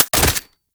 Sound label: AK47 Mech; Warframe; Tenno